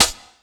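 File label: snare drum, 145 BPM, Drum & Bass; Ueberschall; Drum & Bass Flash Trackz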